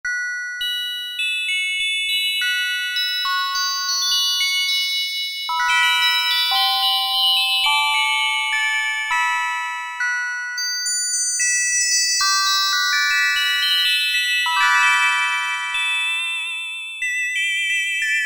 BELLS, Oberheim OB-8; Factory Patches

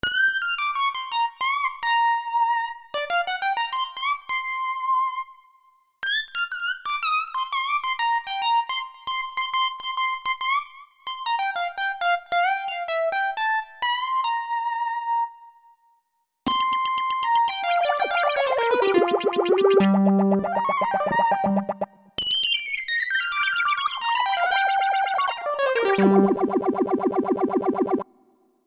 Lead, Korg Radias-R; Factory Presets; Dukey Lead

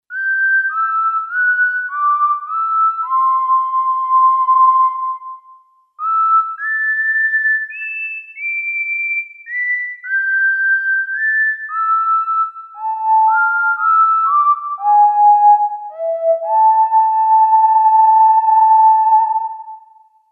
Whistle, Roland JV-880; Factory Patches

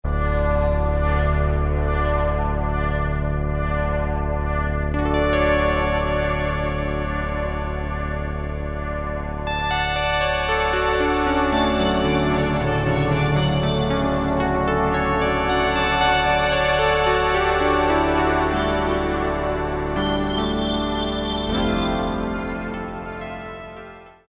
World Muzic, Dance; Roland SR-JV80-06

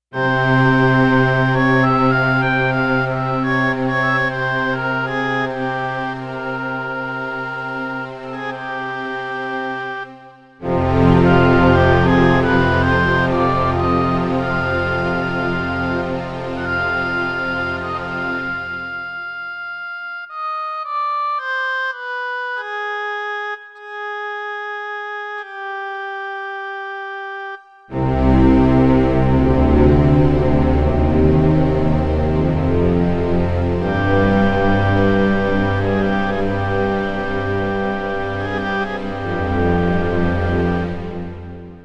ORCH TO OBOE, synth

